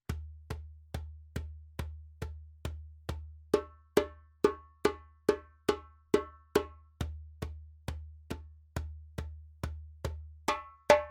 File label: djembe; djembebog oevelse